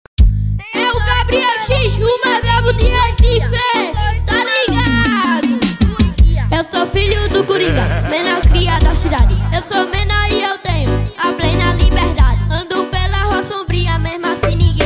funk

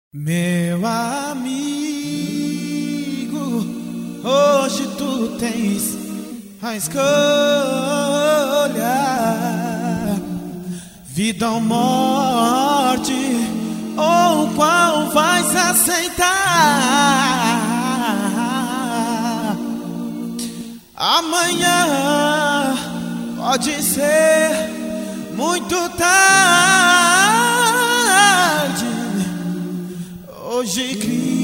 acapela convite

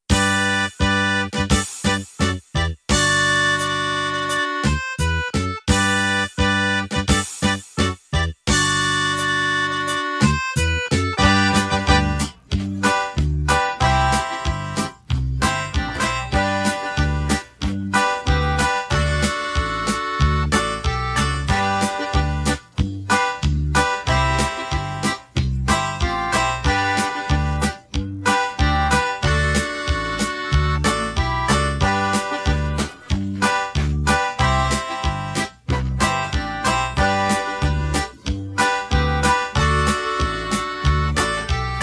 poeta da dor instrumental

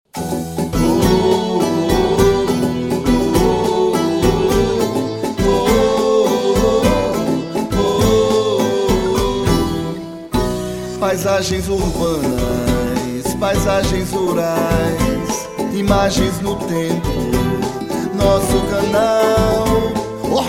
jingle; tv oeste 2012

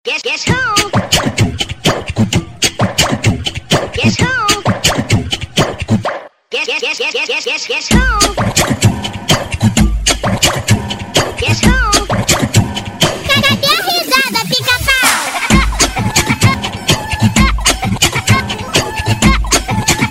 toque para celular pica pau

